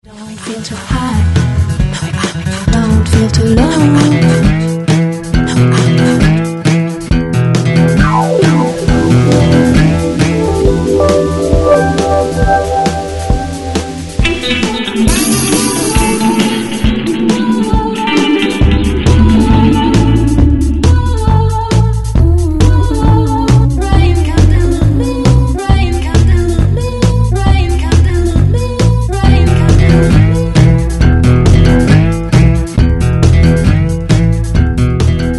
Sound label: brazil rain